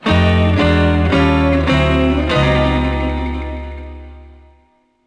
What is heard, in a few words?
Bike Failure; bully scholarship edition